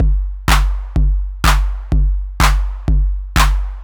drum eight stripped 125, Classic Chicago House Drum Loops